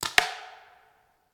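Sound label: Paintball Gun Impact; Sound Ideas; Guns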